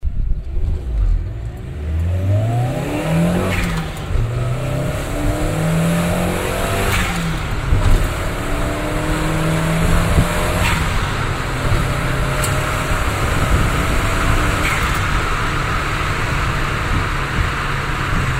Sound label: exy10 turbo moving, muffler; car